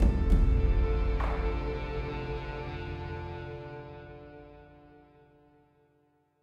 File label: Boss Walk; assassin s creed chronicles china; E01 Naan